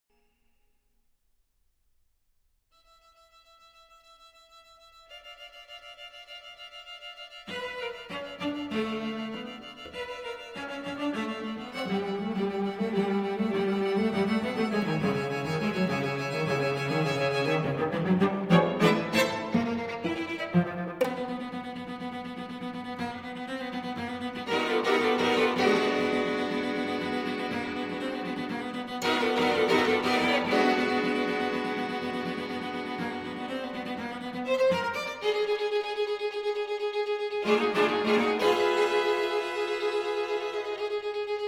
String Quartet in A Minor, Introduzione, Allegro, Allegro Vivace